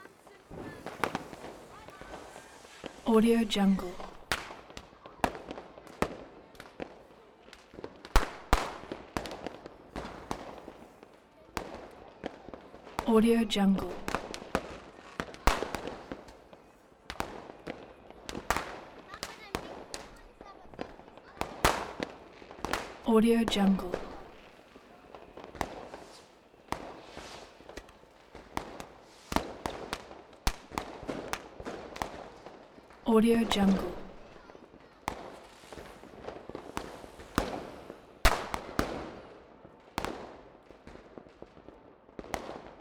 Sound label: ambience fireworks loop; soundbits soundfx